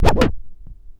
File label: ST SCRCH, SCRATCH